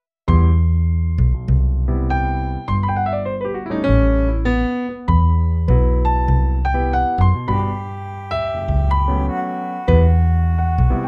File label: swing